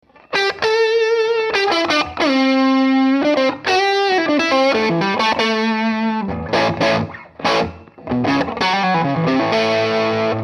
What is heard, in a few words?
wide range neck dirty; lollar